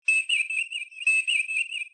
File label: com beep, computer